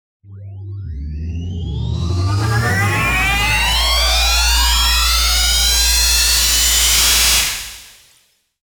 techno disco powerup

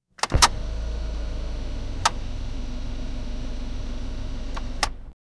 soft leather creak faint, automotive

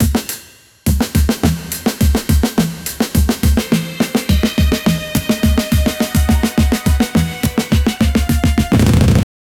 music gets louder

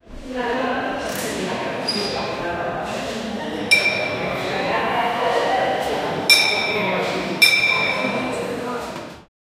accurate sounds inside of, gym

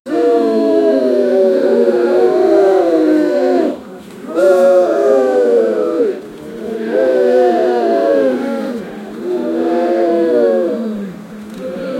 a crowd of people frustra; emotions

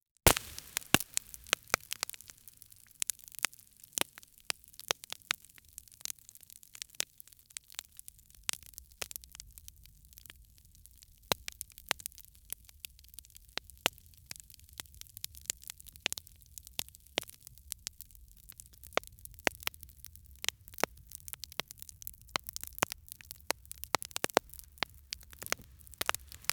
intense crackling fire

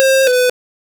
short 2 tone negative ui, beep

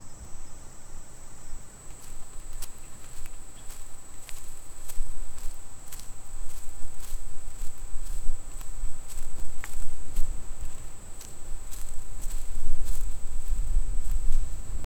soft summer breeze distan

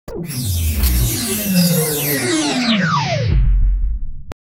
a dj sample drop sound

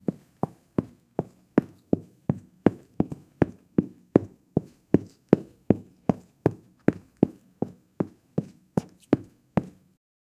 soft teacher footsteps, classroom

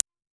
very soft text typing sound

